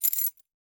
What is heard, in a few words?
Keys Jingling; InventorySFX